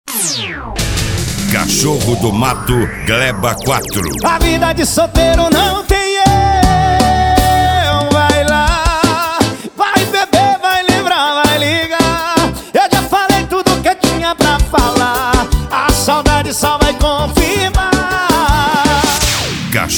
sertanejo; cachorro do mato gleba 4

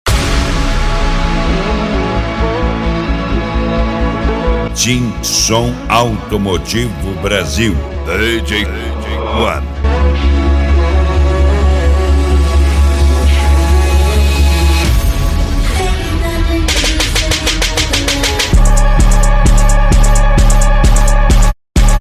som automotivo; team som automotivo

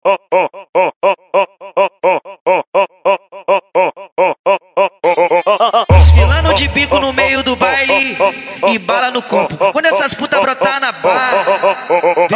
funk mega bass

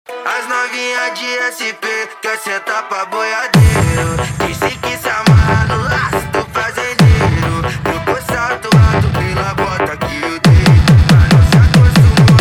mega funk pancadao

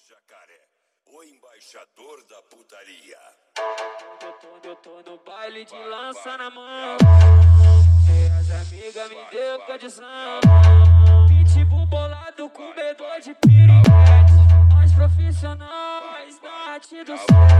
pancadao funk

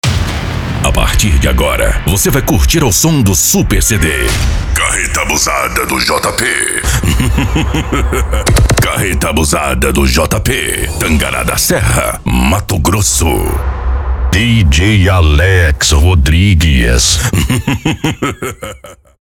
funk ritmado

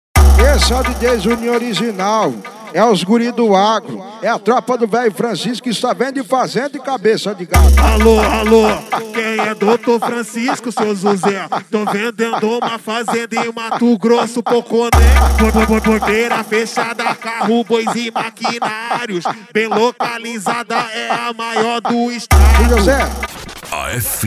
esp funk automotivo; afsound

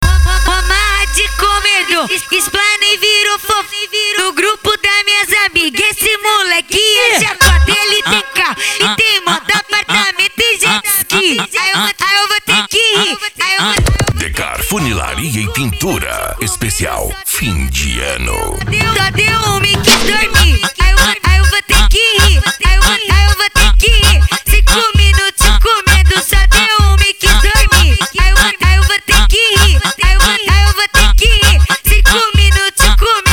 funk ritmado

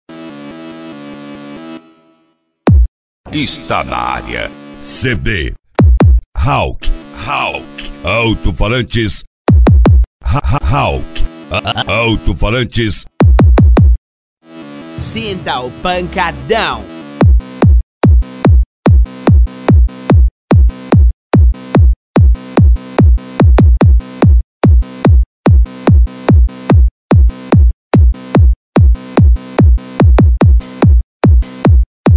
frequencias; hawk altofalantes 2015

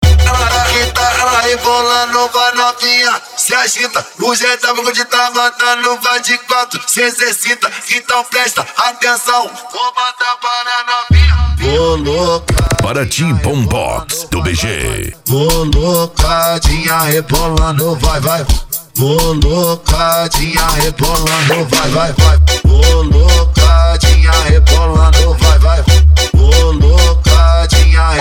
bombox funk

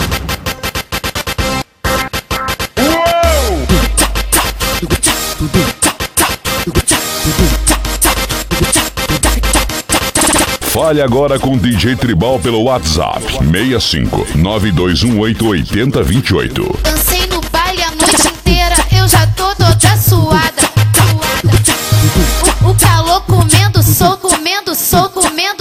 funk bass antigo; cgb cargo transporte e logistica

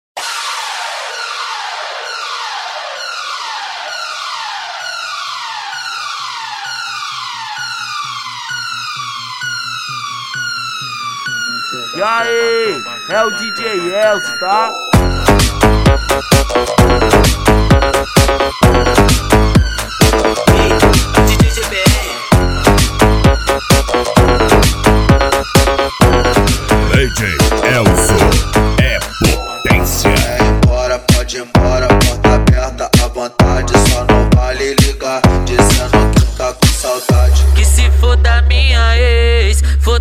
rave da sirene eletrofunk

